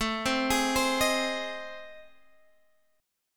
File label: A Minor Major 7th Flat 5th, Guitar Standard; arpeggiated; AmM7b5; x,12,10,13,13,11; JGuitar